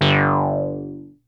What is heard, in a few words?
ARP 2600 G2, synthesizer; Hollywood Edge - Giorgio Moroder Rare Synthesizer Collection